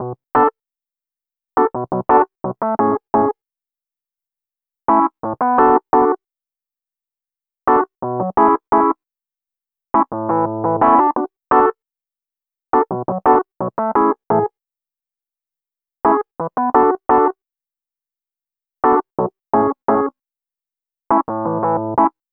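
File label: EP Chords, electric piano chords, electro lounge; Sonic Foundry (Sony Creative Software); 086 Sister Strut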